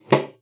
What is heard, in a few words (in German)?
Ventil; Xsample VOL-3; STACC+FX